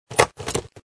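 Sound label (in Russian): сшиватель офисной работы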